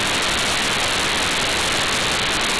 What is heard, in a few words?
White Noise; freedroid